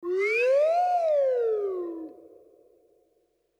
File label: Cartoon, transition